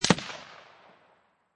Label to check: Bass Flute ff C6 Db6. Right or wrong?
Wrong